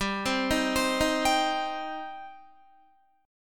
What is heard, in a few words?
G Suspended 4th Sharp 5th G down Guitar; arpeggiated; 4F,4A,5C,5G,5C,6E; JGuitar